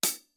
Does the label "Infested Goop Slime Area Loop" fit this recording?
No